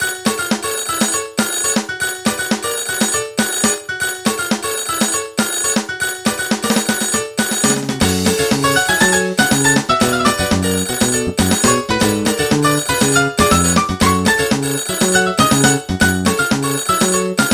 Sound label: Nokia Montuno